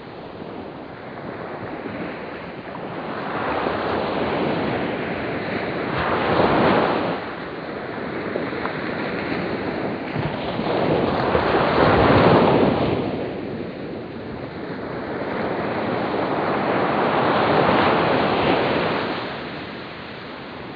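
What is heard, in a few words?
thunderstorm; ziranjie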